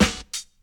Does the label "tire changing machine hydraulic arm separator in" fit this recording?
No